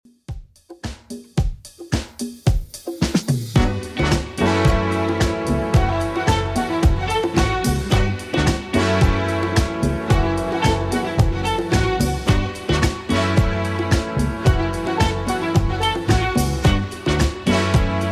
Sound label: 70s Redrum 110 bpm CLEAN